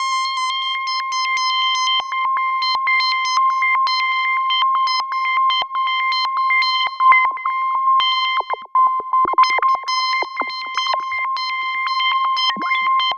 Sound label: Roland JV 2080, interval; MG INTERVAL